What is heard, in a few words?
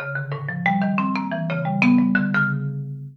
marimba